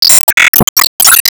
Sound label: Emo Warcry